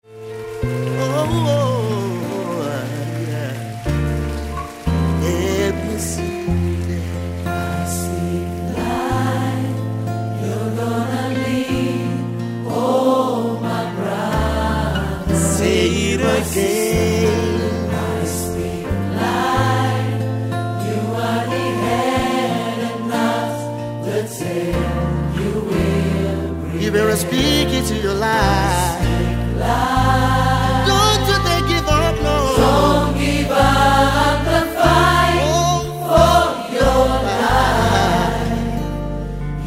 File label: Acoustic Medley